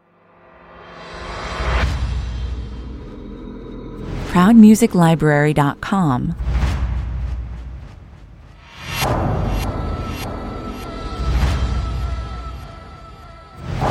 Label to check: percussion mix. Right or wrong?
Right